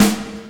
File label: sfx perc